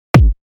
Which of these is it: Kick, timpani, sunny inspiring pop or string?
Kick